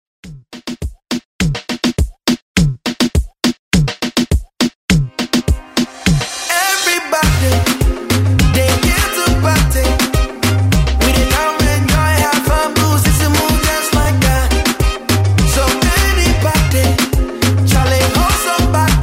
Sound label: Reggae Reton 103Bpm